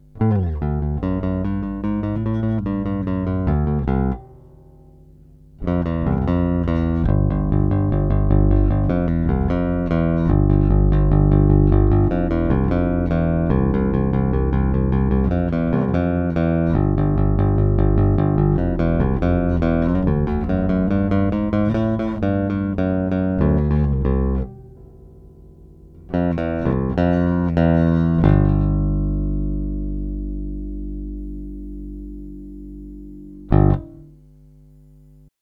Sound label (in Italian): manico plettro aperto, pickups